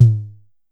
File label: Drum X Tom; Pearl Drum-X